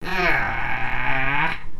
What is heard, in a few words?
Growl